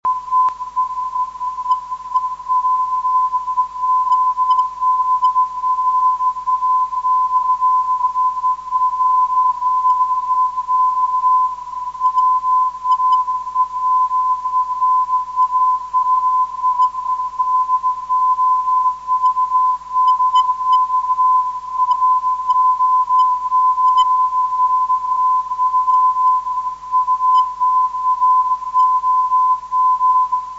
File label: cw on 583khz